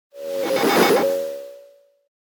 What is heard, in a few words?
Computer SFX short; soundbits